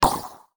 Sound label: Multimedia Internet CD-Rom Flash Blast